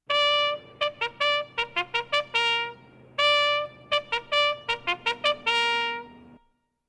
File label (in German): Hornsignale Abtreten